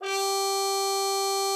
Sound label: CUP MUTE, G4; Xsample VOL-3